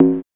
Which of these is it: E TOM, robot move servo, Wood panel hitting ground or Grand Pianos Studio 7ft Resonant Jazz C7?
E TOM